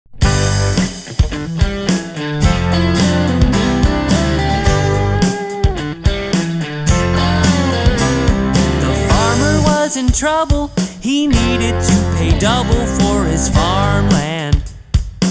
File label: Barnyard Band